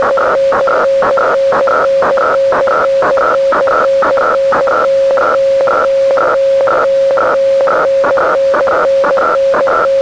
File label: Weather Fax Audio